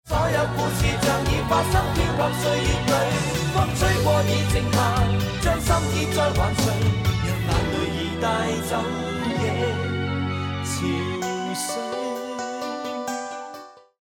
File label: lost round; music kits custom